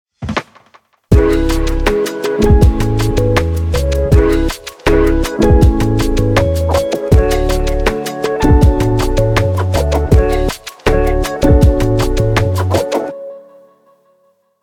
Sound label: smooth lofi chill